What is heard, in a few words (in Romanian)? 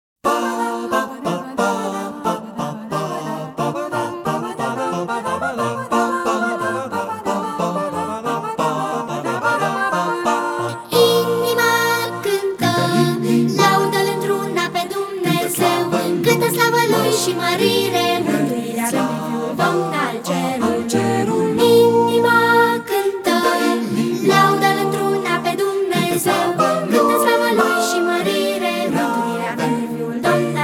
Inima canta, colinde